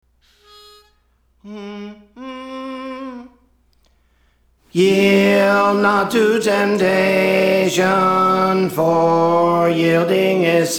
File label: Alto; Praise For The Lord Training